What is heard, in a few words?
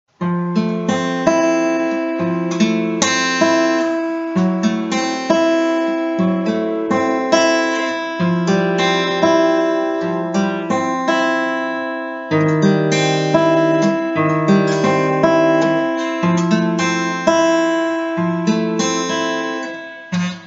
сэмпл Bpm 117